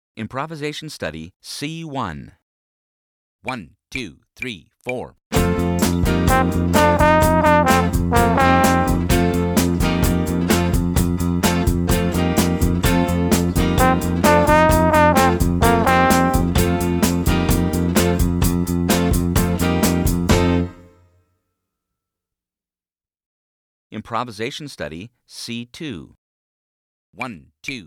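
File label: improvisation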